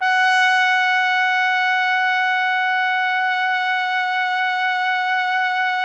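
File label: trumpet; Roland - LA Composer vol. 3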